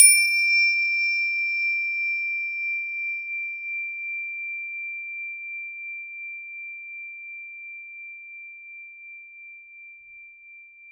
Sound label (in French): crotale